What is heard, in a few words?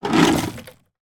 toy stickle bricks tip onto carpet from tub; sound effects one